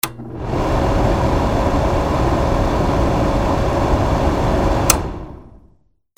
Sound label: CAMERAS